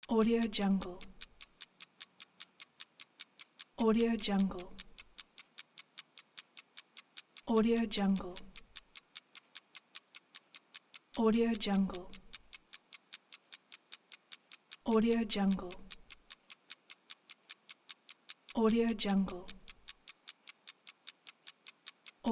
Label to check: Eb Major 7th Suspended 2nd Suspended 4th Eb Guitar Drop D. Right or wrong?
Wrong